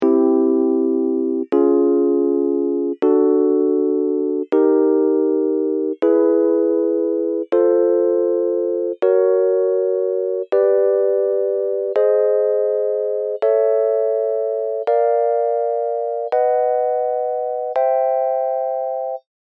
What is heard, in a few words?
pythagorian